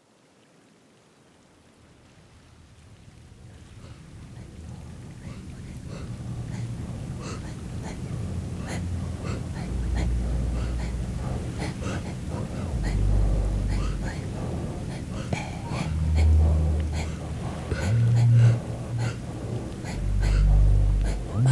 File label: Breath and Synth Experiment; International Anthem